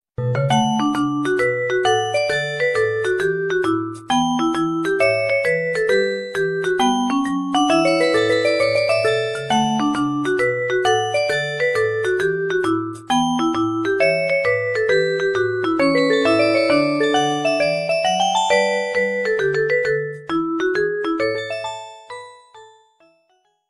instrumental ringtone guitar piano cartoon ringtones